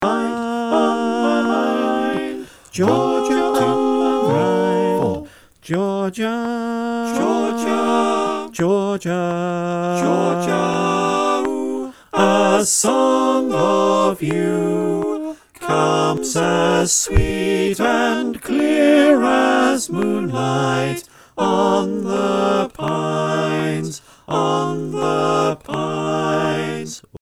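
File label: bass click track, choir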